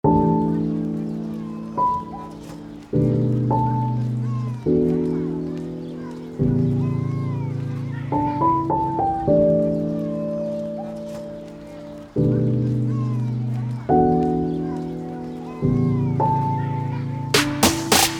Trapsoul

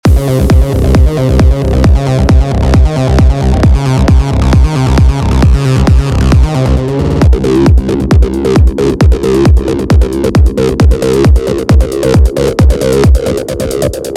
Acid Techno Basslines

